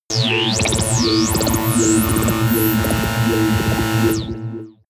alien synth

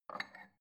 bowl take soft

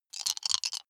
big three rattle in hand closed short

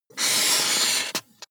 marker mark hard long

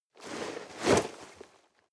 nylon leather hard short

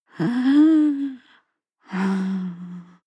breath female whimper breath

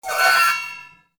scratch

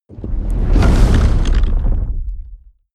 fire whoosh experimental large

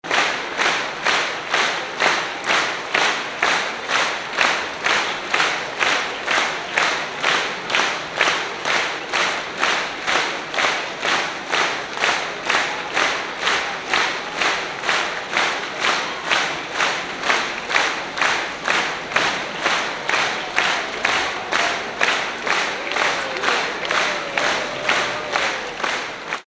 applause rhythmic large

